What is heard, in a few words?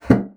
bassoon slap; Xsample VOL-6; C BASSOON